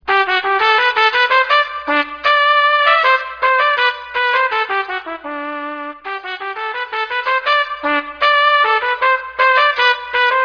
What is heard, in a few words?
melody